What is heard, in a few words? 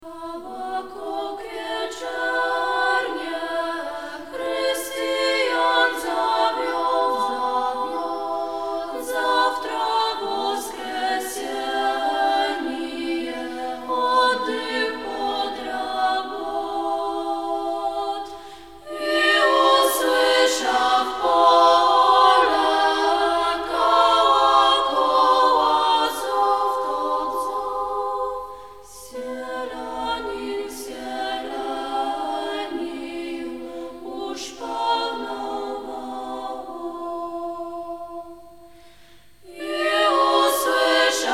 Kolokol, Cerkiewne